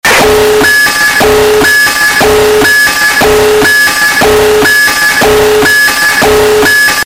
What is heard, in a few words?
nuke siren